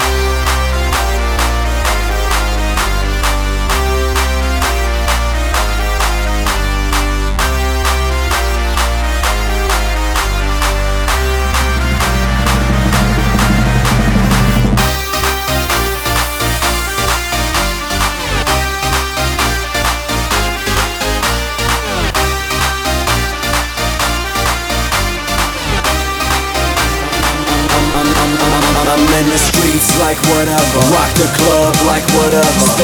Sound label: trumpet vocal mix